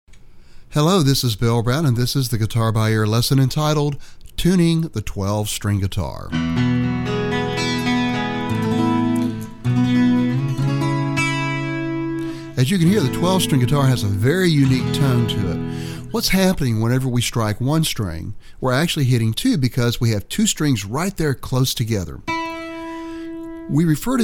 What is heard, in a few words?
Tuning The 12